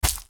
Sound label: Character Gen Bounce Small; Angry Birds Epic